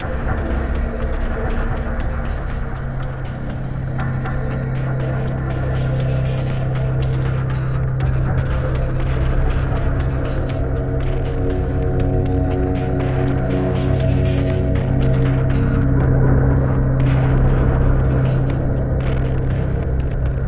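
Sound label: Jeep Production Ambient; Level 2 Jeep Production Ambiant; N.O.V.A. 2 The Hero Rises Again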